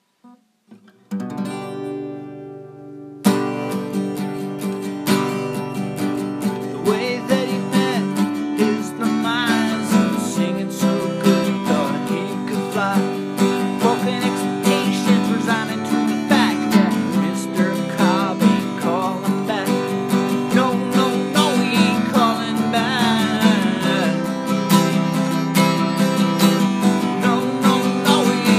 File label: acoustic